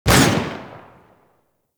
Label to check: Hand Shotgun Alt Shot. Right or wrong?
Right